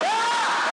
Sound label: Vox